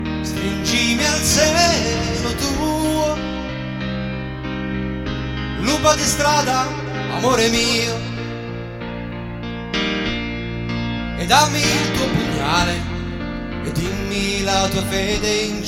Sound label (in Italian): versione alt.